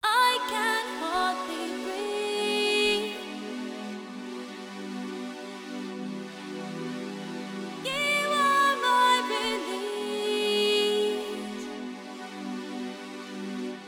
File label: vocal chill 432 hz